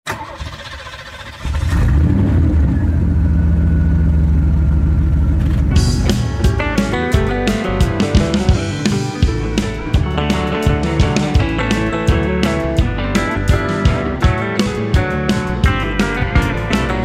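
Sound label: intro with drums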